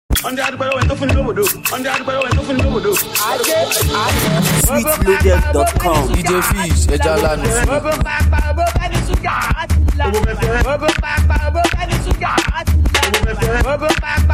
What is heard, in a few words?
Beat; Cruise